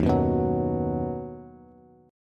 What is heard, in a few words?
Eb Minor Sharp 5th Bass; Ebm#5 strummed; JGuitar